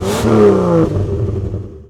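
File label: Truck Cherokee Rev; SFX Kit; Trucks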